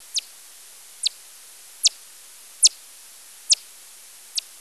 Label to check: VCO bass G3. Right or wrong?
Wrong